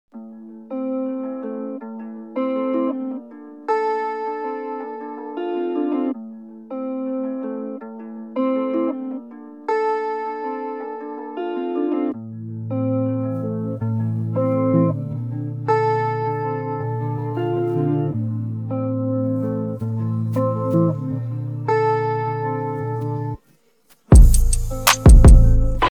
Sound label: instrumental; persian